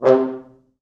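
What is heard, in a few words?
4 French Horns, Horns; C2; East West; Quantum Leap Horns Sax; 4 FrHorns MOD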